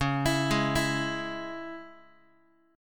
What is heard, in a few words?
C sharp Minor, Guitar; C#m; arpeggiated; JGuitar